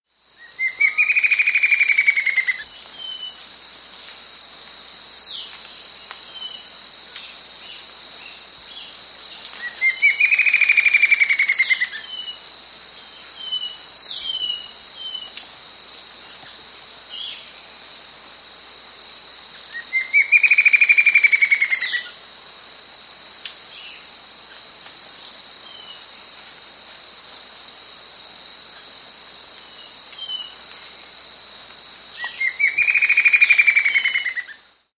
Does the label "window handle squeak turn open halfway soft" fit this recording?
No